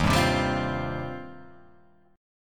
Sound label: D sharp 6th Add 9th, D sharp, down